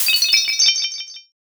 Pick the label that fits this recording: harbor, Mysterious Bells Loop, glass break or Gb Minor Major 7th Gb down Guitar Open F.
glass break